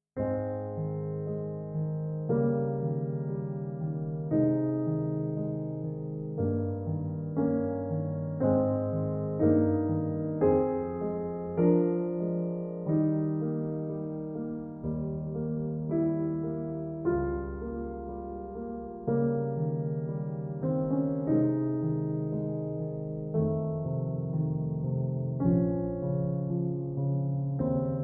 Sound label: Sonata In C Minor Op13 2 Adagio cantabile; Ludwig van Beethoven (PD)